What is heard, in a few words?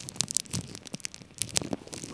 heat grill; Crazy Machines; Играет вся семья